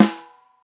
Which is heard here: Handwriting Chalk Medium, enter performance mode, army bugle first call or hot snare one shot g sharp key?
hot snare one shot g sharp key